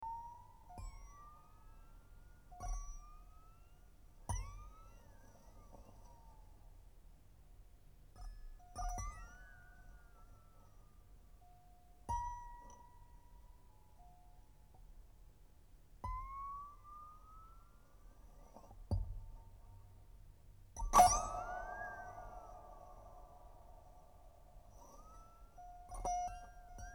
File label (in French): Cithare